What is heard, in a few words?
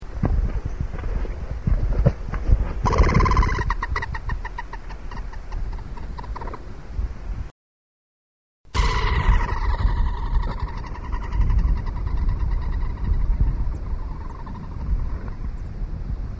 golden spotted ground dove